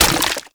Run Sludge, Footsteps; Warframe; Tenno

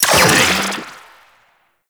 Shock Rifle Primary Shot 3D, Weapons; Warframe; Corpus; Shock Rifle Vandal

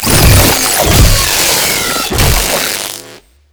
Proto Infested Ancient Tentacle Swing Flesh; Warframe; Abilities

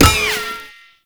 Tank Shield Impact; Enemies; Gameplay; Lasrian Tank; Warframe

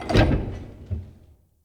Archway Gate Door Close; Under City

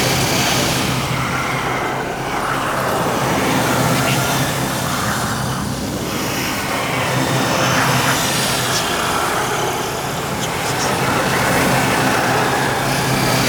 Portal Shatter Open Loop; Warframe; Duviri Murmur; Ambience

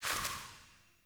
Sparrow Death State One Wing Flaps; Animals

